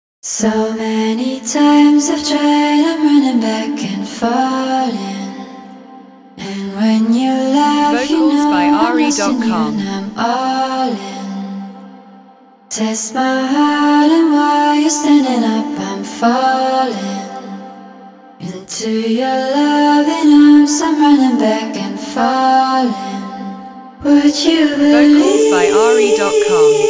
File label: 160 DMin, vocals; 160bpm